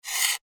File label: knife scrape